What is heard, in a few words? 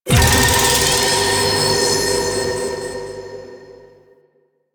Tower Detector Alarm; Medusa; Warframe; The New War; Sentient Devourer